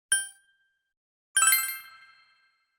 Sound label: notification sound; persona 5